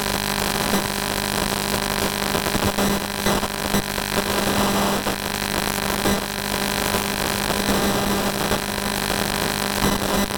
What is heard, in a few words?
5G TDD n41